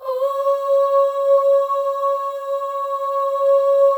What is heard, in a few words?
OH, FEM, Symphony of Voices; Spectrasonics